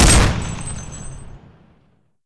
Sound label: bar shoot, weapons; dod 1.3; dod enhancement pack